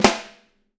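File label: 6X12 ALUMINUM; Living Drums; Sampleheads; Peter Erskine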